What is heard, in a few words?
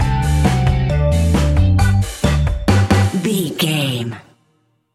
stinger, Classic Carribean island ska reggae music!